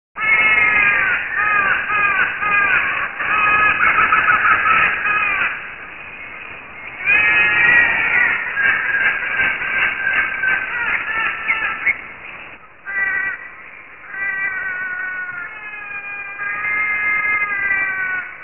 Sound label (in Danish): Trompeter Hornbill